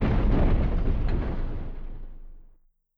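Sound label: Collision Interior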